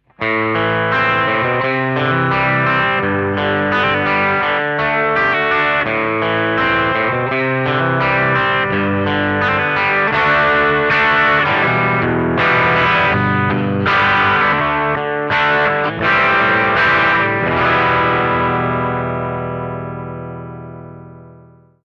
brit combo

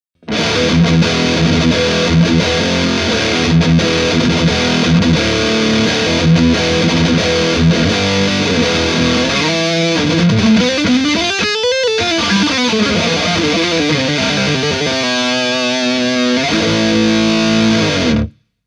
cube-80gx extreme

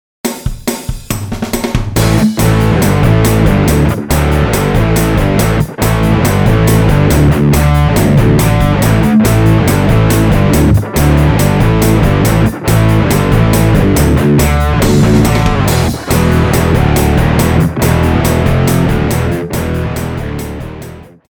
patch demo

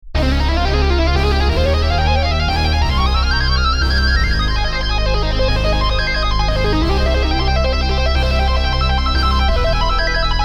dream lead; fantom-g

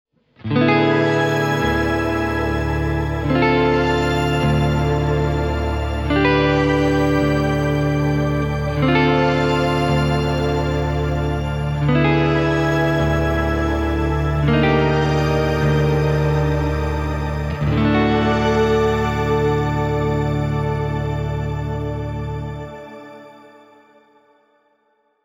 Shimmer

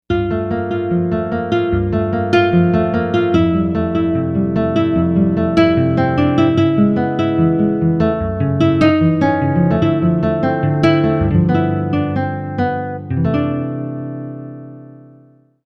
nylon gtr; juno-gi

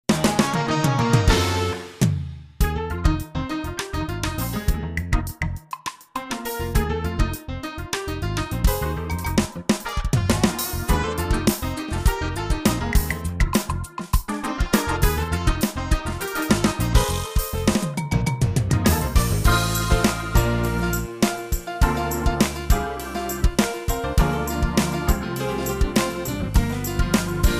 td 6s

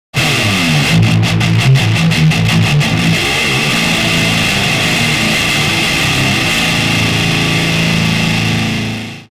metal setting

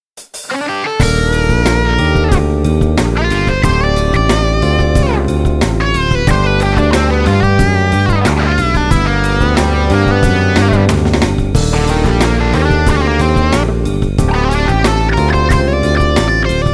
patch demo

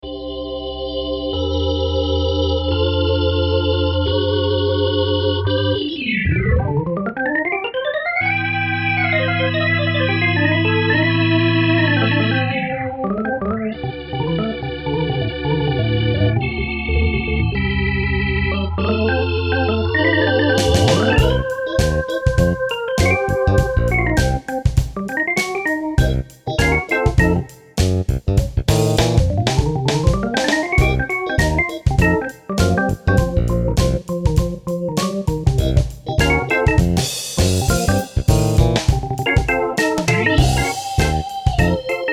organ tone

